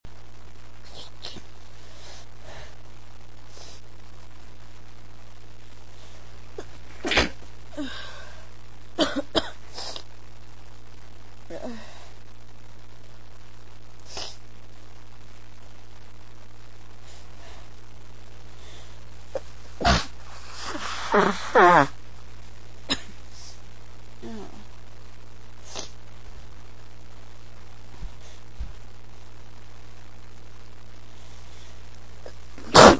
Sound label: worst cold ive ever had, female